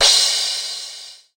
Misc Cymbal; EMU PX-7

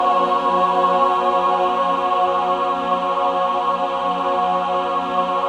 SUS F#4, SUS.CHORD, Symphony of Voices

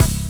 BASS DROP, Synth FX Misc

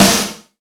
SD VRB, Yamaha RY-10

